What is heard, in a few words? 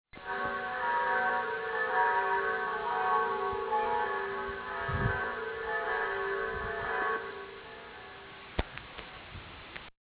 bells